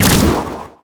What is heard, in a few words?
arrow launch; weapons; dota; mirana